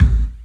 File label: LEDRMK, 3D Drums, LESLIE KICK